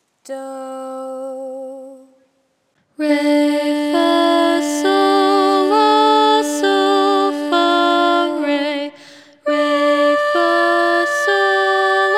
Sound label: Intonation both starting note